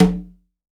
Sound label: CONGA, PERCUSSION; AKAI S6000 CD-ROM - Volume 5; GM PERCUSSION KIT